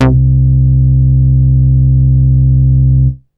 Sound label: BASS; SoundFactory; SMPMANIA